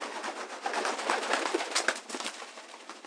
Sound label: bird flapping; animal